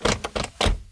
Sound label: car door; Mafie 1